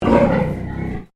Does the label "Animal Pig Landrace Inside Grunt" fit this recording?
Yes